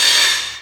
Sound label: grind, manhack; hl2